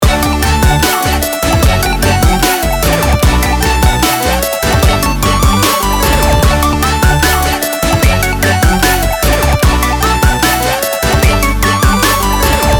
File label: pixel heaven ringtone